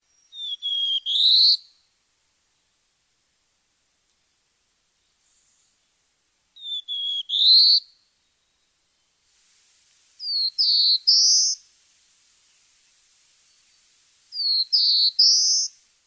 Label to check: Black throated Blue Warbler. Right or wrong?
Right